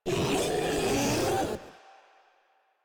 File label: Infested Ancient Vocal Attack; Warframe; Proto Infested